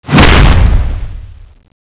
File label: smash; the monster plane; kolmods